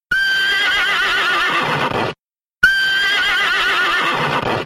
Horse Whinny Sounds Car Horn

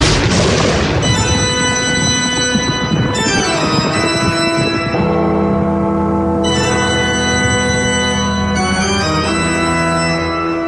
Halloween Haunted House Sounds Car Horn

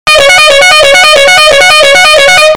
Wild Turkey Air Horn Sound Car Horn

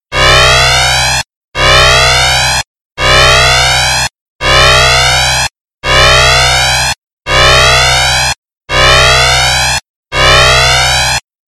Science Fiction Alarm Sounds Car Horn

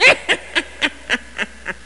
Witch Cackle Halloween Sounds Car Horn

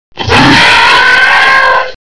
Velociraptor Roaring Sounds Car Horn

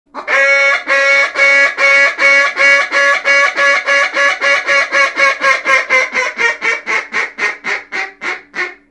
Mallard Duck Hail Call Sounds Car Horn

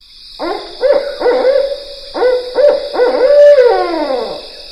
Barred Owl Sounds Car Horn

